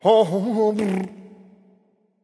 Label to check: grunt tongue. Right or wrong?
Right